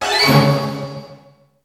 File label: orc hit; soundboard